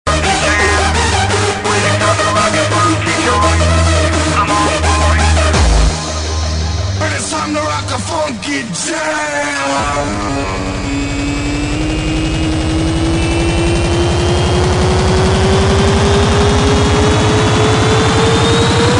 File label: brisk funky